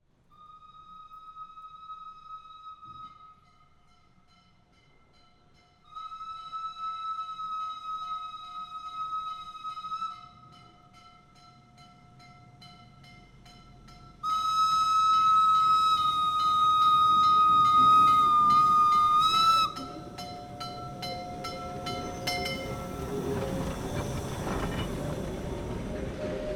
Steam Train Pass by, Rolling, Whistle, Bell, Grinding, Howling, Railroad Crossing, Slow; Close; Tone Glow Libraries; Steam Trains